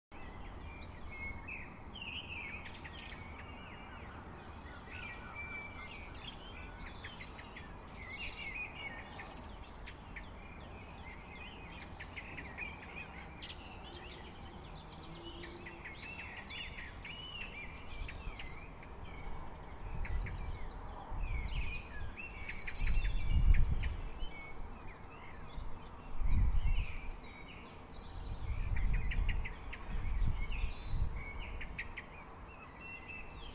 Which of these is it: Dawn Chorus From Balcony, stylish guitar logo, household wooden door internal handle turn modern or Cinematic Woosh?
Dawn Chorus From Balcony